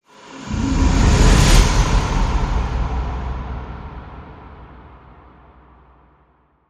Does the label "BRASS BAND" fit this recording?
No